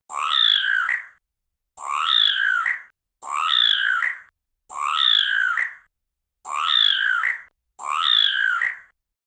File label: angry frog and orc sound; upset